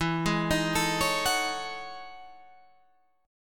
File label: E-Major 13th, chord; EM13 arpeggiated; JGuitar